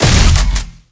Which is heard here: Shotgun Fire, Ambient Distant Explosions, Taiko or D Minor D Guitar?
Shotgun Fire